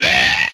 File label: Hit, Vocal; MMRetroArcadeSoundsPack